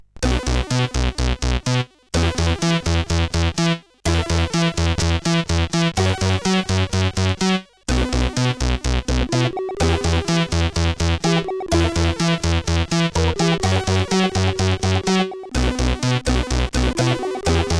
Blue Ocean sid CSG8580R5

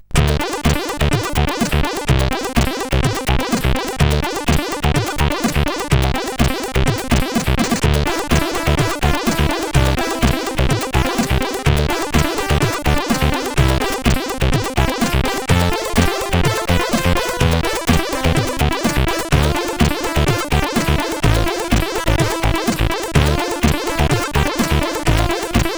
Funkriff, sid CSG8580R5

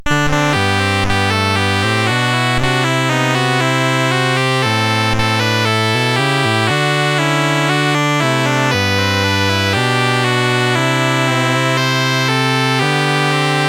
BASIC sid CSG8580R5

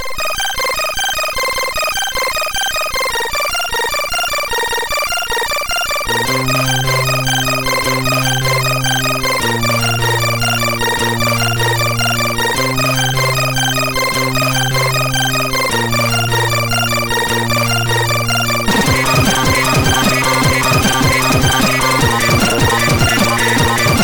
Flatline tune, sid MOS6581R2

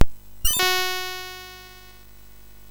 sid MOS6581R4, GAMES; Chinese Juggler

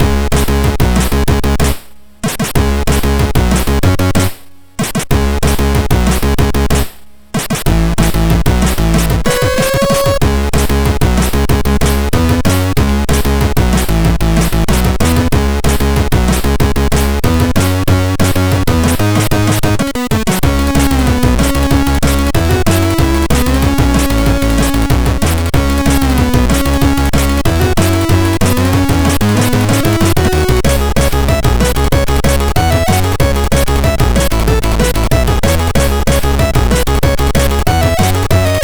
MOS6581; Speedtro Tune

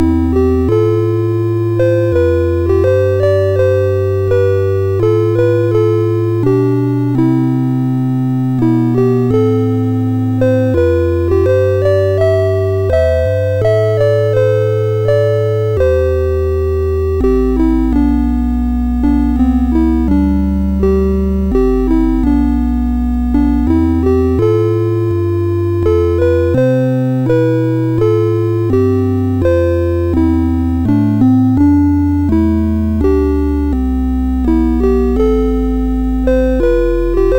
sid MOS6581R4, GAMES; First Starfighter

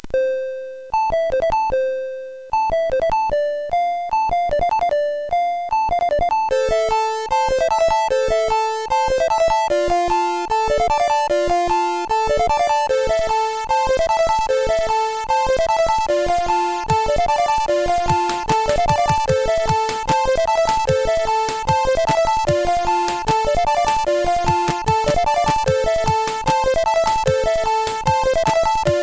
Acid Bells, sid CSG8580R5; hvsc

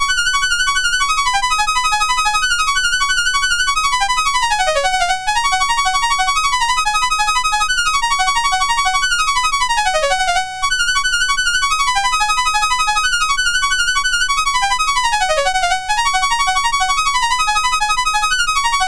Piano, MOS6581R4

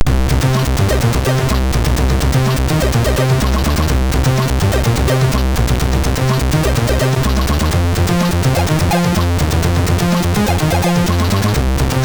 intro sid MOS6581R3

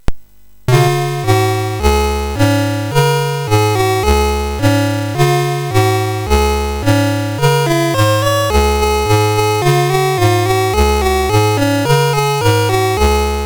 sid MOS6581R4, GAMES; Forest of Doom

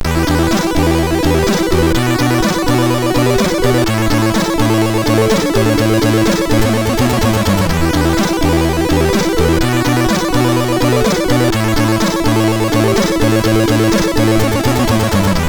title, sid MOS6581R4; hvsc

